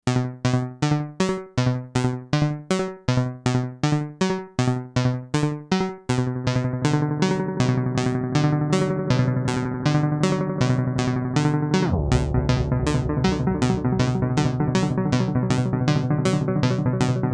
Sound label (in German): Moog MF Delay Modulationen Selbstoszillation